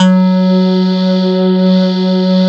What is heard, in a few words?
SYN, Roland, digital; Sound & Vision - Gigapack I CD 2